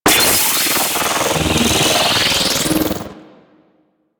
Corpus Powerplant Lightning Hazard; Ambience; Warframe; Corpus Railjack; Tether Power Plant

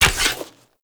Staff Equip; Warframe

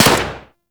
Pistol Shot; Guandao

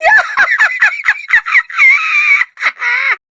Laugh; Grineer Queens Guards; Battle Barks; Warframe

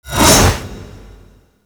Hammer Glass Whoosh

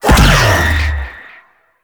Whirlwind Dash Empowered Impact C; Warframe; Warframes; Caliban

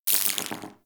Minigun Mech; Warframe; Corpus

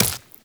Run Flesh, Footsteps; Warframe; Tenno

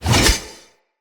Heavy Whoosh R to L, Swords; Weapons; Player; Mockery Weapon Common

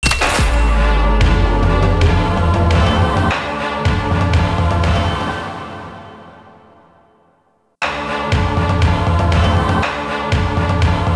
Tower Music Phase; Warframe; Bard Quest; Orokin Tower

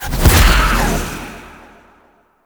kavat teleport; Warframe; Khora

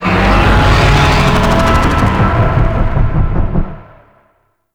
Void Dragon Vocal Death; Enemies; Vocals; Warframe; Duviri

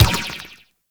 Ball Bounce Rock; Warframe; Tenno Ball; Missions

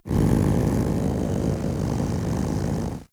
Kavat Vocal Idle; Warframe; Khora

